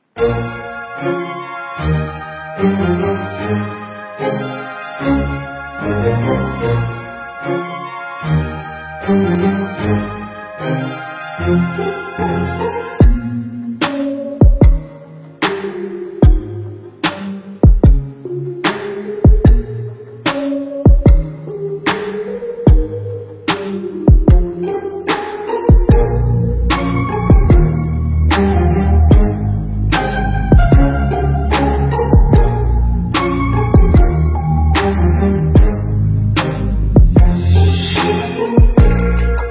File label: Instrumental